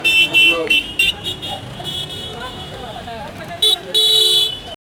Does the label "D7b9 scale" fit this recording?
No